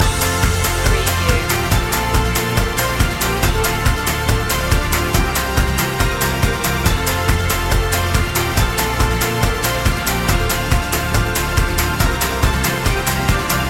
upbeat country fun loop; country-rock

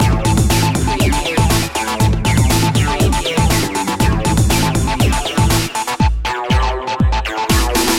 unnatural science loop; up tempo

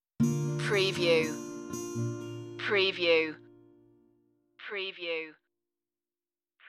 Acoustic Country / Folk; stinger

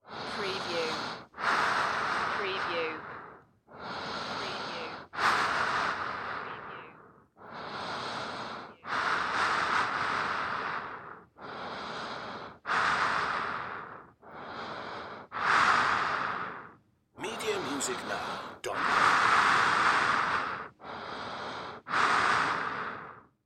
Rubber Mask Breaths

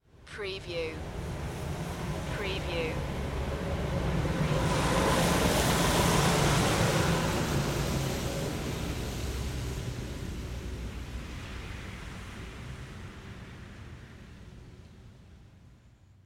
Auto Pass By Wet Road; vehicle; Sound Effects Bible

